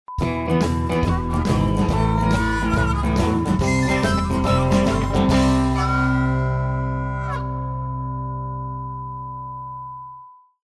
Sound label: groovy jingles